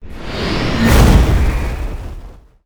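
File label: arm demon attack, monster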